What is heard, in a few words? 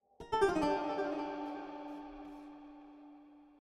spooky; forest bgm; head verb; chicory a colorful tale; ps4